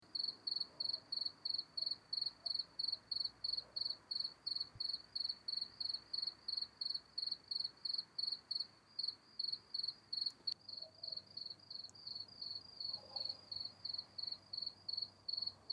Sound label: Cricket